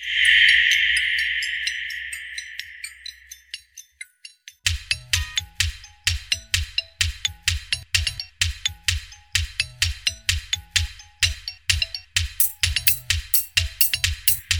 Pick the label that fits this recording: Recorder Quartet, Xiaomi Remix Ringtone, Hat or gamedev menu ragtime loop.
Xiaomi Remix Ringtone